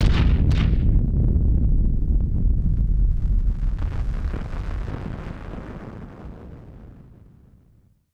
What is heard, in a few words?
Firework FX; musicradar; bomb factory